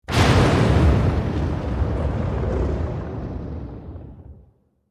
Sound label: Dracula thunder strike vampire